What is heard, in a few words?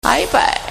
Message Ringtone